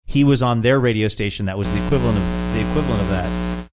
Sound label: buzzing sound on microphone